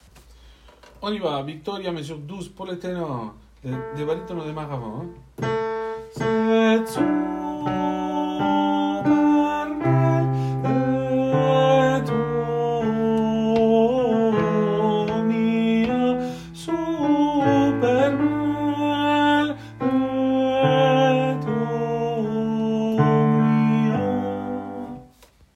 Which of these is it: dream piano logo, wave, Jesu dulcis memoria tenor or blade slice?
Jesu dulcis memoria tenor